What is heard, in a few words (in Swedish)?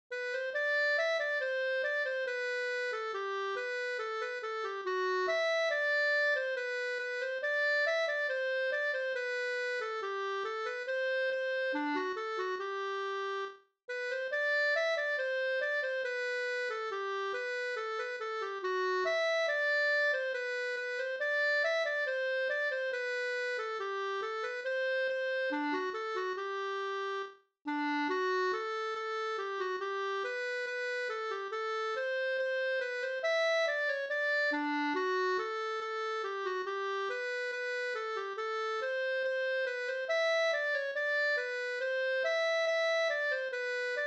brudmarsch Are brudmarsch